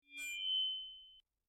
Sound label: Notify